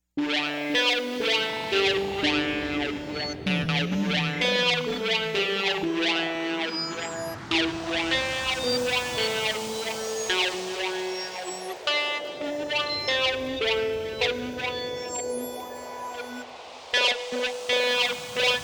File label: Jingle Bells heavy